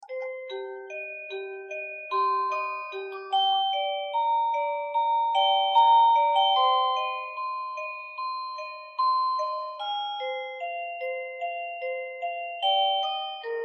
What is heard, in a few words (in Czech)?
melodie